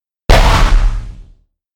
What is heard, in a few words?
Sci Fi Shield